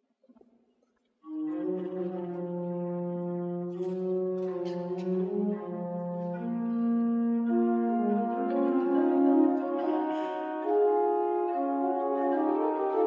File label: Gothic organ